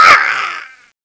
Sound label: fly zombie hurt